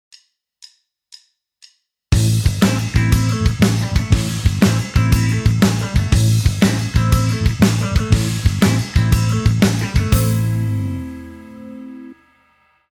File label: backing; Guitar Techniques 2017 Issue 272 August